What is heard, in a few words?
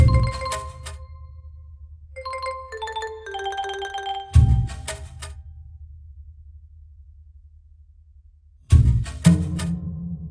Jungle Level; Sim Theme Park; Theme Park World 1999 pc gamerip